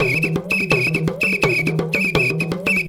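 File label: tama foudang groove; Best Service; World Colours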